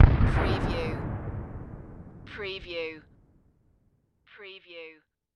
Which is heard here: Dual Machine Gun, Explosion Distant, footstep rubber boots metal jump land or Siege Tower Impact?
Explosion Distant